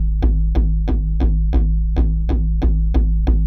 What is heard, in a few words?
surround, techno 138 bpm; KOELN; Best Service ProSamples vol.54; AKAI